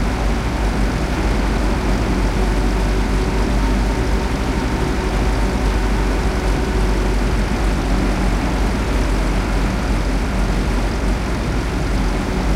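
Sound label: m4a3 engine interior rpm 33 load, tanks; War Thunder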